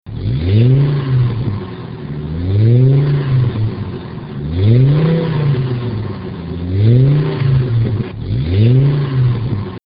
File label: Colorado 3.5L Single